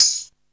TAMBA

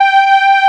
Soft Synth G4; Multisamples; Digital Sound Factory Xtreme Lead

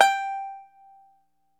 ARCHTOP, Arch Top Banjo

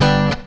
FUNKY, Grooves

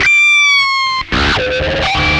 whammy fx, guitar

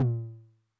High Tune Long Decay, 909 LOW TOM; classic machines; LT - LOW TOM

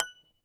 F#5, Yamaha VP-1; Music Store; 90's Sample CDs; MIYABI

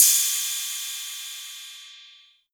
808 Cymbal; Novation Drumstation

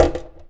PUT, household; AKAI Anime Sound FX

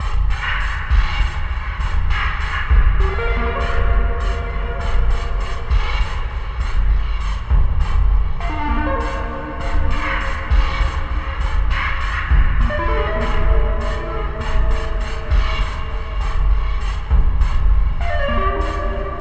Deep Space Distortion Groove; Extra Tweak Grooves

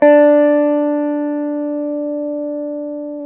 GUI SUS D 3, E-Guitar; E-GUITARS

